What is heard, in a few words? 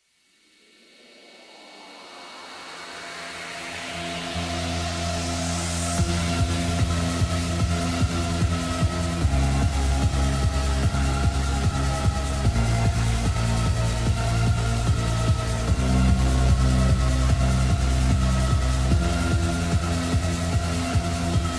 sped up reverb; Roblox; DOORS